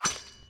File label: hits